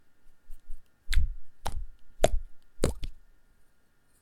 slime blop toy, A slipe popping out of its plastic can